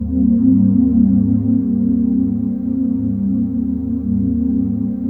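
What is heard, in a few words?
trance pad; ATMO PADS; Best Service; XX Large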